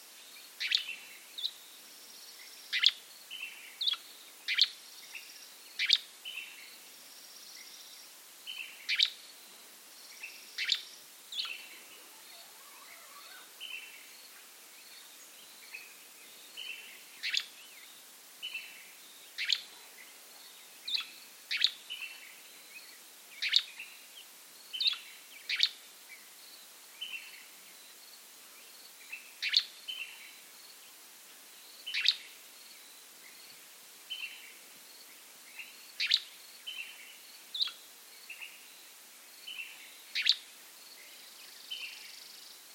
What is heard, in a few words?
American Grey Flycatcher Empidonax wrightii